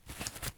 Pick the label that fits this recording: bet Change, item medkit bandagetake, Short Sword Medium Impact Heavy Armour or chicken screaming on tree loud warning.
item medkit bandagetake